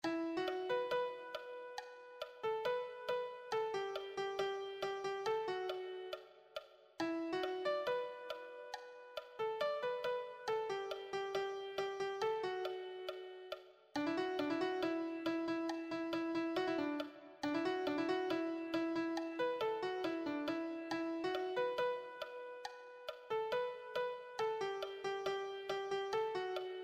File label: melody